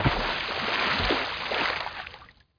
Splash; Real War Air Land Sea

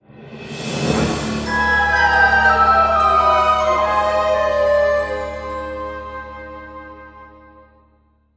Battle Lose

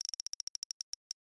terminal cash counter

